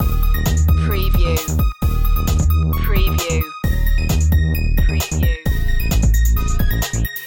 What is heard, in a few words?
retro machine loop